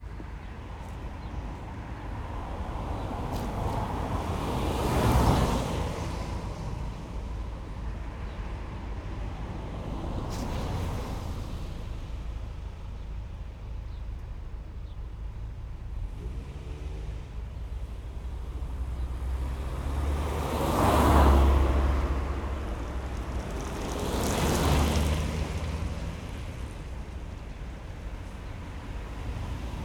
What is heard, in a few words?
traffic, discrete car passes on narrow street, daytime, summer, ORTF stereo, Moscow Ambience; Alexander Kopeikin